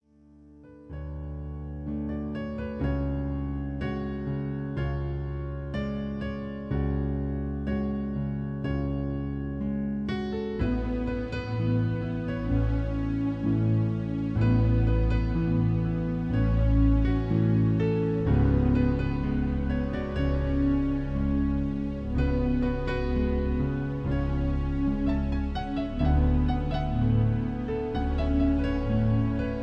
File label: Piano Version